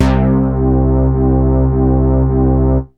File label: BASS; SoundFactory; SMPMANIA